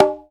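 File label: PER PROCU, Procussion, percussion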